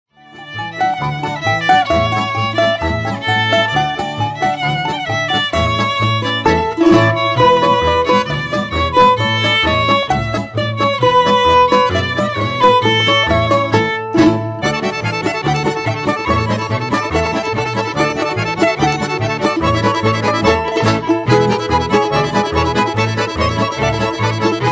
Trio CZARDAS

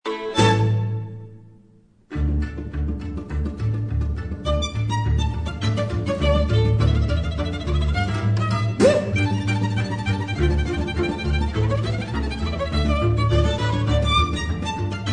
czardas

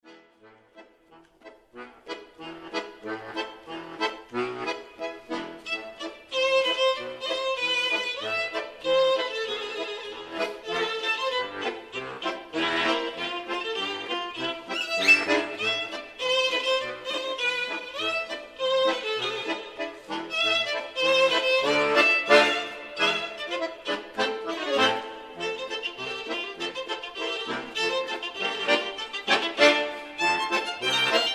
polka

